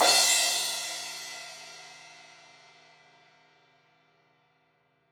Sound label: Crash 16in edge, Cymbals; Deeply Sampled Drums